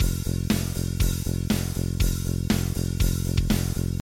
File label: Kick Bass Acoustic